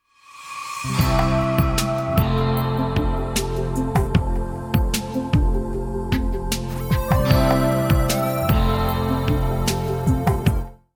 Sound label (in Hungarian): Orakozi alapzene; SZEKELYUDVARHELY